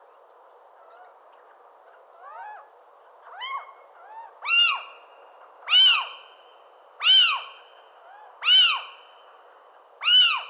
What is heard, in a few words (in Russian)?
domovyy sych athene noctua; nochnyh ptits